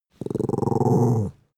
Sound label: dog small growl short; natur-tiere; hunde